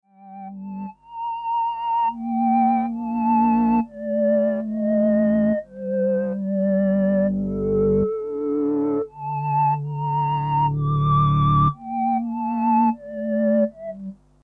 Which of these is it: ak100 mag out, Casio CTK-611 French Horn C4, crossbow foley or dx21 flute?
dx21 flute